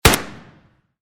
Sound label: match success; fps3d